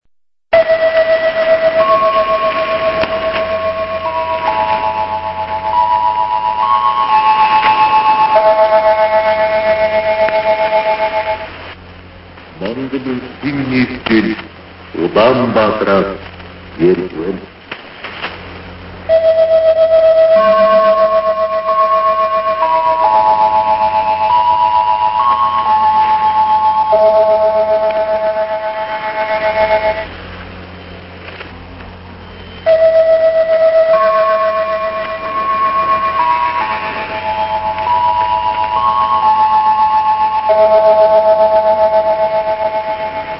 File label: interval signal; rub xs c1979